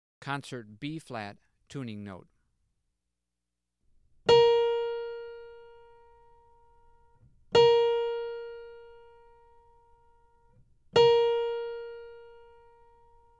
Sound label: Tuning Notes; James „Jamey“ Aebersold Play-Alongs; All-Time Standards